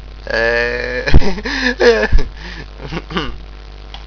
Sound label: ehh